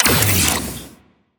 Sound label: enter